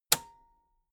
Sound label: metal button radio spring release